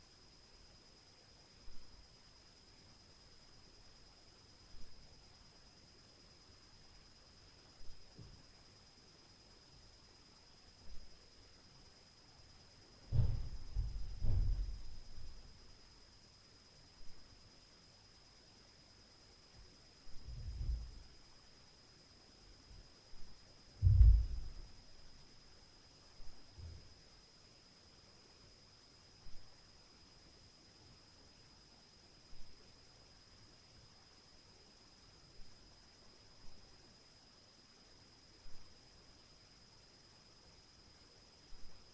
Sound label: Upstair Noise BOOM; Night Noise